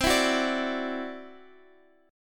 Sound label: E 7th Suspended 2nd Sharp 5th C, guitar